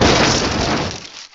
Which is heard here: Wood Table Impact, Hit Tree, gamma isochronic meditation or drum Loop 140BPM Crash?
Hit Tree